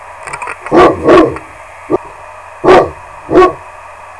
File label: woof